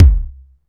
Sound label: BD ROOM; Best Service ProSamples vol.54; AKAI